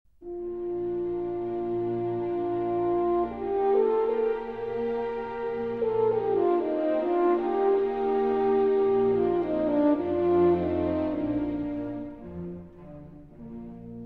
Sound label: Horn Concerto in E-Flat Major, Romance. Andante cantabile; Mozart Horn Concerto No. 4 in E-Flat Major, K. 495; II. Romance. Andante cantabile